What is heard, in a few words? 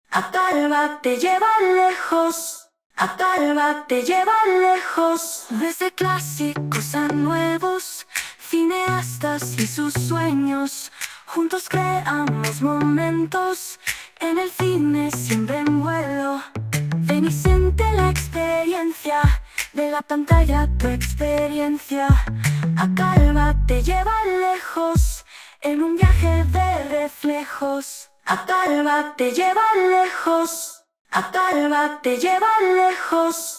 JINGLE